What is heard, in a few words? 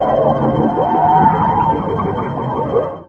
spaceship, Electro and Synthetic